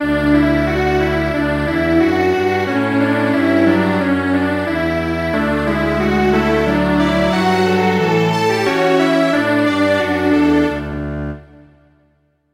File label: Strings Quartet